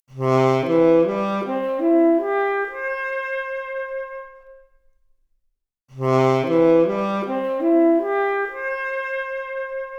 Saxophone arp, mp Stereo output, 3bit

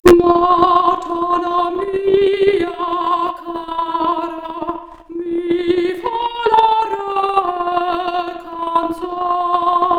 Soprano Stereo output noised, 3bit

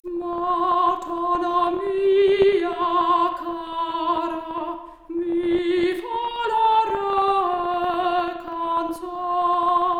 Soprano Stereo output noised; 4bit

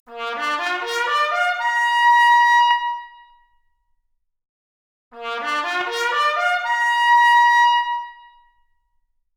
Trumpet arp, Stereo output noised; 3bit

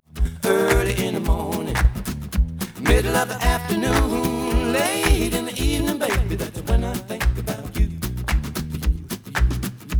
noised; 3bit

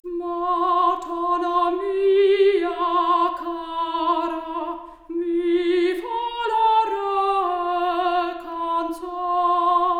Soprano Stereo output, 4bit

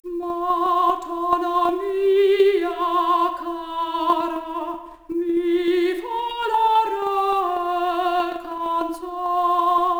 Soprano Stereo output noised, 3bit

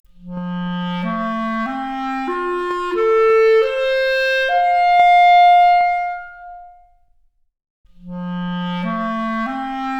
Clarinet arp, Stereo output noised; 3bit

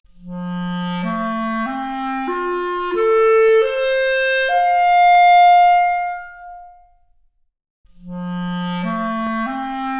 Clarinet arp, Stereo output noised; 4bit

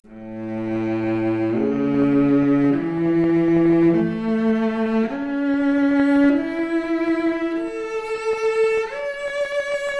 Violoncello arp, Stereo output noised; 3bit

